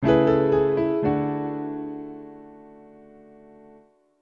sound logo

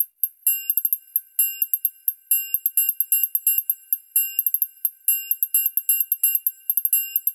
triangle

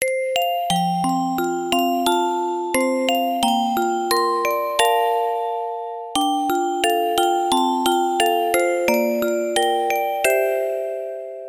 sister walking

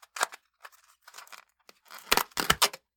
natto

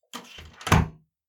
studio door close

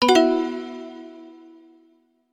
cancel

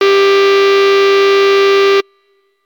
error

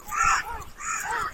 crow

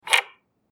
card key

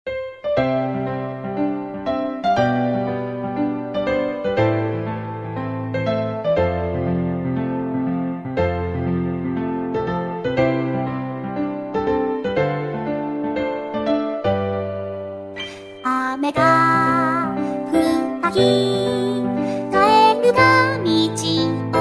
frogs march

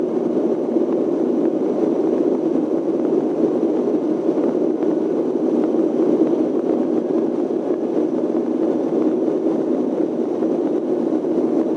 airplane

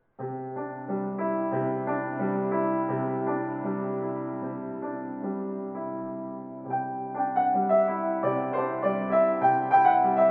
everyday happy

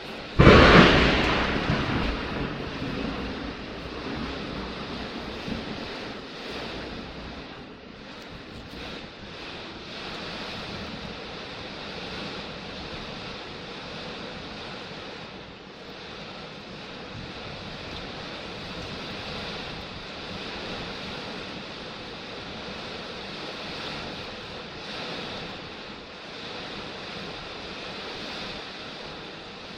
thunder

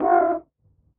cat

